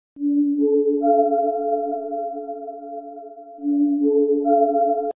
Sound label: appealing music meme